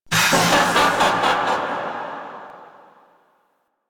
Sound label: Corrupted Laughter; UI; Boon; Warframe; Duviri